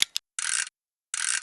wind

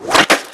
skateboard; bucky

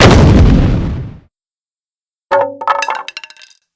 down palette; olive

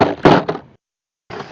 start skate; bucky

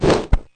scene change

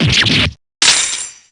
damage

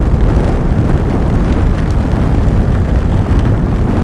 bigger burn; excelsius

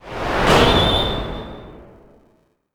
washing; dd

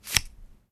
business card

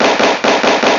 heavy fast clap

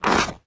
bend

